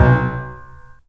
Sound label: PLUCKS, The Piano; InVision Interactive